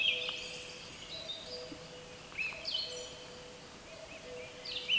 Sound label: Cuculus canorus